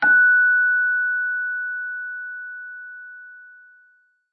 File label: chime